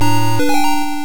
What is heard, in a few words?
Unused Jingle; Arm Champs